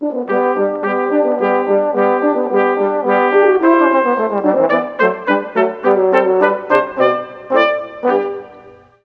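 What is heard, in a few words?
Tbn Hn Trp, trombone horn trumpet; Juilliard Music Adventure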